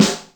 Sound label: Rold Gold, Yamaha DTXpress Acoustic Snare